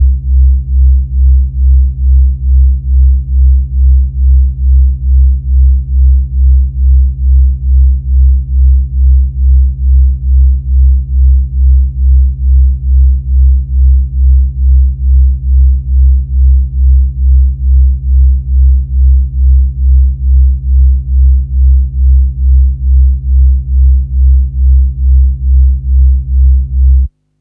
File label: Reese mono